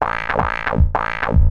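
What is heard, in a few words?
synth bass, drum and bass, 160 bpm, C; eJay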